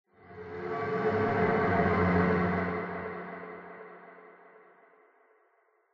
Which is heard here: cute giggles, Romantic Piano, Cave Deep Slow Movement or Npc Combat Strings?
Npc Combat Strings